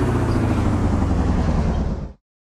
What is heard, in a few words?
shelter generator off; Original TK Sounds